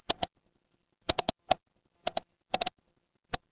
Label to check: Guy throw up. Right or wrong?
Wrong